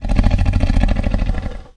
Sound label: moan; The Elder Scrolls III - Morrowind